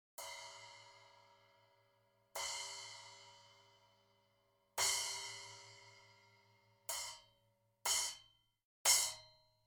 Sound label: Splash Cymbal 10 - Plastic Rutes